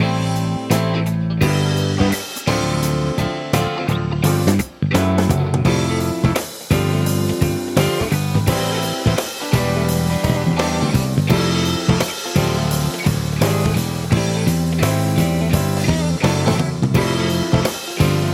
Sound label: bass